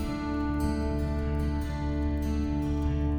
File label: Picking G